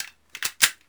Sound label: Gun Handling Weapon, Weapons Foley; SFX Kit